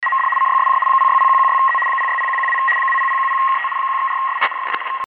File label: weather sounds rtty